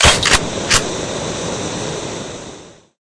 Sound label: clip out, weapons; cstrike; AWM MONSTER